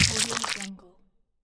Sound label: zombie bite sound